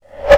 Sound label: REVERSE